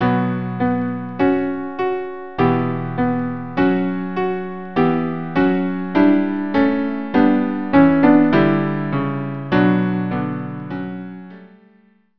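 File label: cello piano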